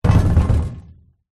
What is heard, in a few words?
industrial heavy object load into metal trailer